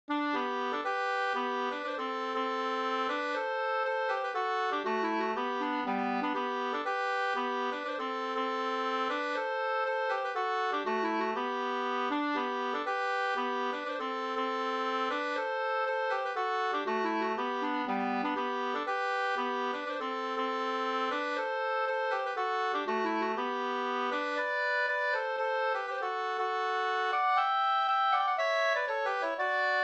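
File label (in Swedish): polska, tvåstämmig; Kumla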